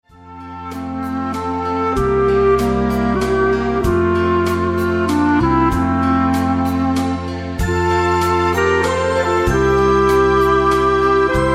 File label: Clarinette D Amour